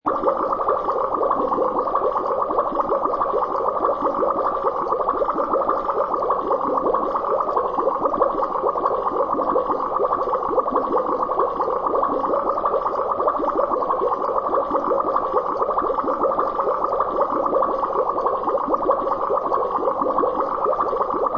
Boiling Lower, The sound effect of a cauldron boiling and bubbling.; Kitchen; Household